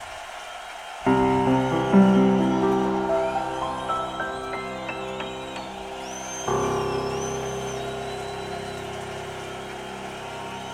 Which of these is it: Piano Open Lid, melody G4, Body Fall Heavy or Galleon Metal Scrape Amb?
Piano Open Lid